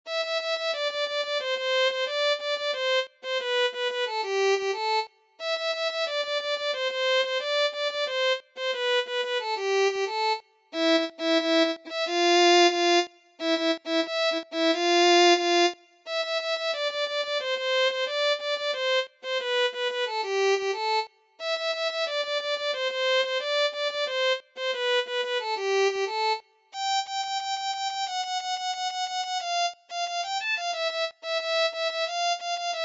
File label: Violin